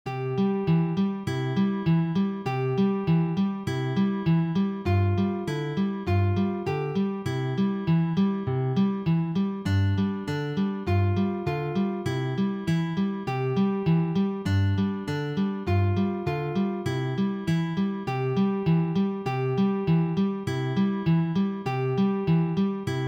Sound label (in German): Gitarre Solo; weihnachten